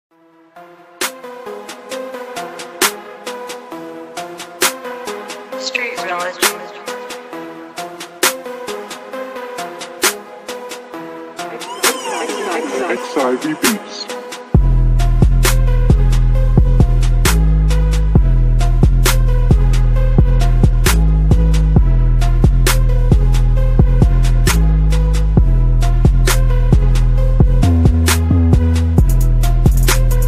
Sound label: instrumental